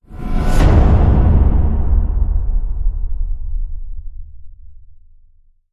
Hit Crushed

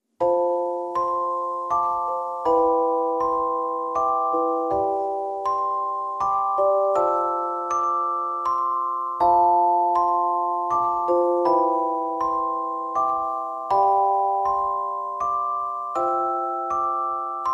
Celeste and Guitar Mix

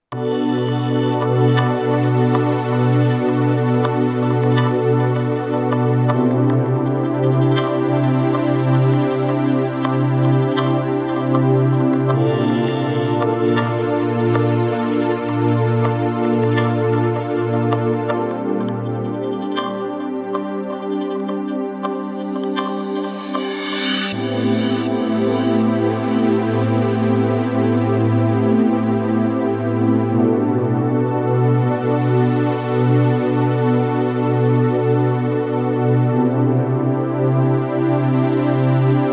Pads & Atmo

